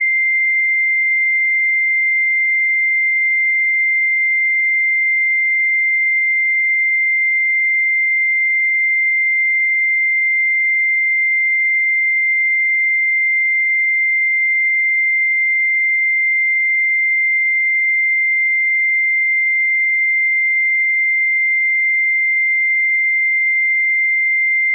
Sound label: 2093Hz